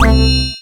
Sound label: Character Hit